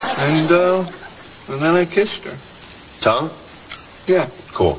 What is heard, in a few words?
kiss